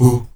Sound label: AHOO B 2; Spectrasonics